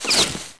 VEG IMPACT

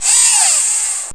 ROBOT STOP MOVING

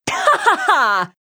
LAUGH; BattleSNDS

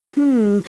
humm; BattleSNDS

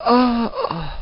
DYING; BattleSNDS

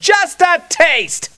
LAUGH; BattleSNDS

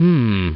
humm; BattleSNDS

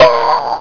DYING; BattleSNDS

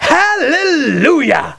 LAUGH; BattleSNDS

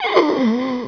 DYING; BattleSNDS

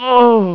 DYING; BattleSNDS

